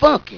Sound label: funky